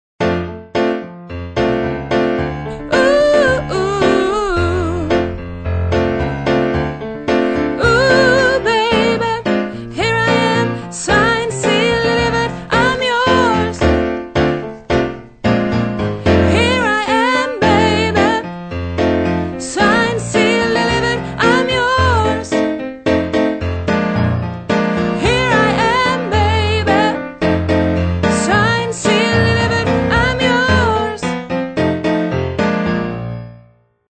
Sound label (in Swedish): sopran